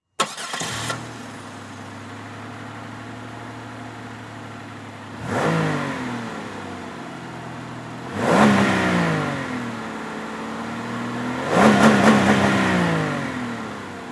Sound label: Transportation Car Monte Carlo Start Idle Rev Off Short